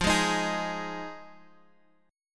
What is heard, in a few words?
F Minor 7th F Guitar Drop D